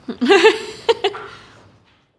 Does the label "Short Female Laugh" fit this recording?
Yes